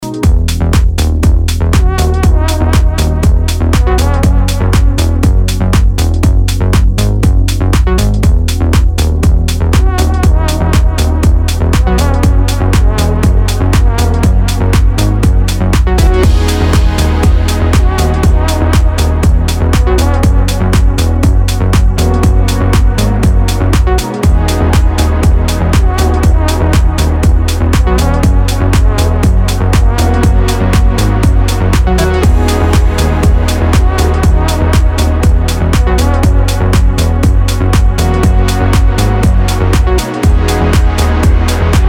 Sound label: disco